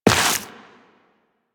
Step Dirt, Footsteps, Walk; Warframe; Tenno

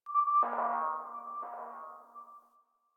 Venus Landscape Distant Robot Call; Ambience; Warframe; Venus Open World

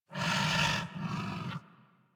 Feral Vocal Breath Walk; Enemies; Kubrow; Warframe

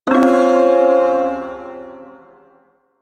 Ersatz Shawzin Phrygian Chord; Warframe; Duviri Ersatz Shawzin

